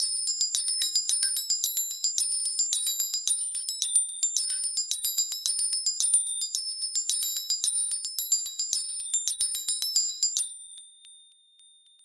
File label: gentle festive sleigh bel